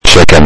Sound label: chicken